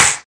CLAP, Volca; drums full kits; style kits; WallsVado Volca Sample Pack; Hip Hop

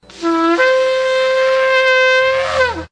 mournful horn; Horns and Brass

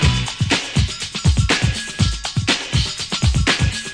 121 bpm, horny conga

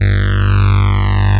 hardsweep, synth

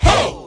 "hoh!" (tribal), Voice

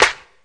clap, drums single hits; Claps

